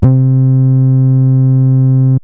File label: Synths BASS